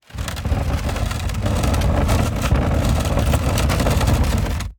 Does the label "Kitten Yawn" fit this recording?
No